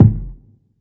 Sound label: kick, classic hip hop